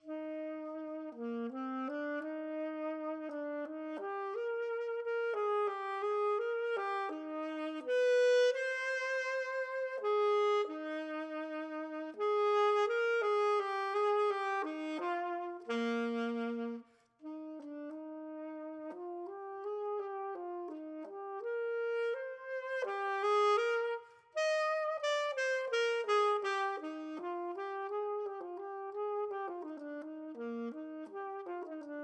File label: Alto Saxophone Etude; Etude 1